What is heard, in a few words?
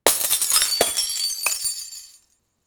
the sound of breaking gla; breaking glass